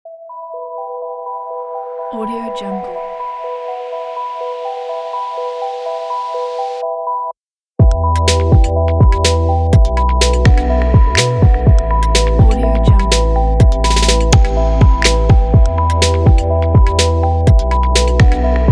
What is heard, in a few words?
vlog uplifting